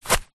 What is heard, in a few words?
foley footstep single grass; zapsplat